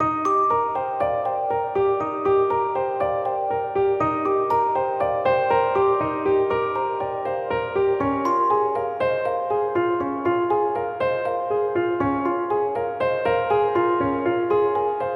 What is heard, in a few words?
Background Music; BirdsSounds in wwise